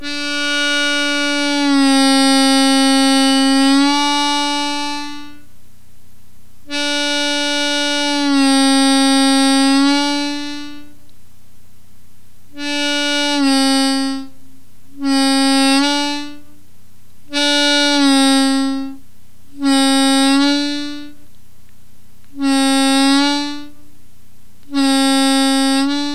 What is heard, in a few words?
bending, harmonica